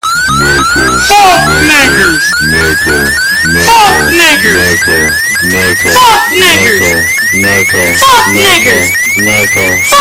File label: charging up